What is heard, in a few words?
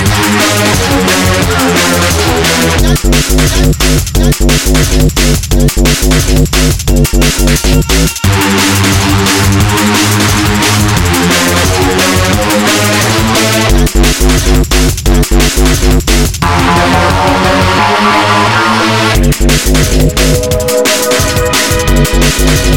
step